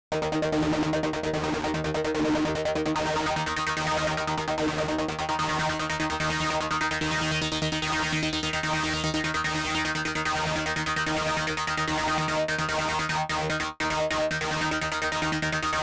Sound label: Chonky FM Arp